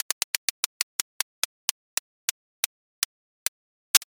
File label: roulette